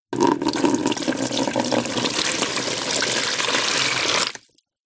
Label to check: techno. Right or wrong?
Wrong